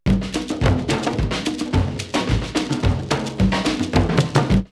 Monrovia 108, drumloops World & Ethnic; X-Static Goldmine

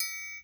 Triangle Op, Yamaha EMR-1

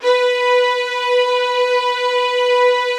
B4 SUS, LOUD VIOLINS; Q Up Arts; Denny Jaeger Master Violin Library

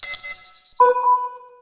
sign, signals; AKAI; FX SIGNALS

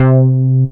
SY BS C4, BASS; Best Service Dream Experience

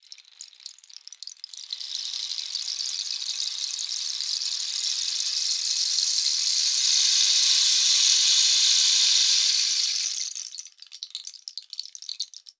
RAIN, PERC; Xsample VOL-9

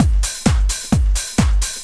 straight, Techno Trance Essentials drum loops

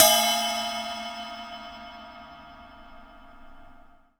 CYM HIT; Sounds Good - Roots Reggae

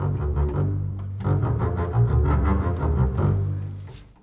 Bass, Orchestral; Sonic Foundry (Sony Creative Software); Orchestral VOL-2; Modern 09

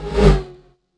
DOPPLER; E-MU Producer Series Vol. 2; More Studio Essentials; Composer; Technopourri

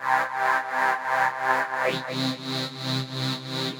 Kaossilator gated (130BPM); musicradar

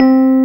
Rhodes; Best Service Gigapack 1

